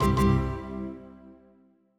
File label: Tada; Longhorn 2000 Sound Scheme by David Mounder